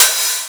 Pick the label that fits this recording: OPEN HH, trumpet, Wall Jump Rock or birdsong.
OPEN HH